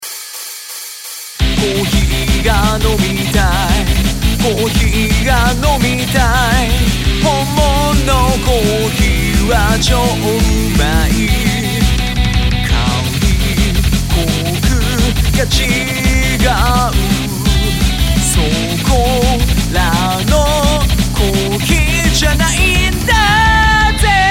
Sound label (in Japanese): 歌